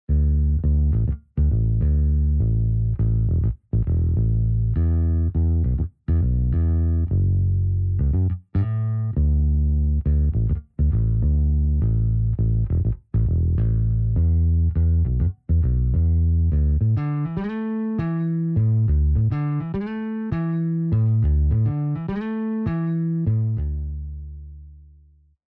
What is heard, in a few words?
bass after